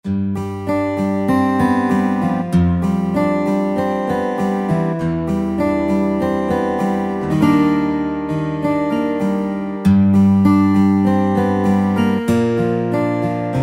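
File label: 12 String Guitar, Yamaha PSR-3000; Factory Voices; Guitar & Bass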